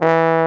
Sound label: BARITON; Best Service; Alpine Volksmusik